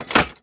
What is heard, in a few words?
Lever Part2; Lara; Playable Tutorial Level